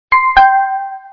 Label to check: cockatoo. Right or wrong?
Wrong